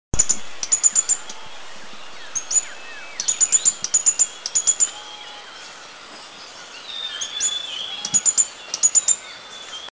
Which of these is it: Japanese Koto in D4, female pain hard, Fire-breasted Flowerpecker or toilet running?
Fire-breasted Flowerpecker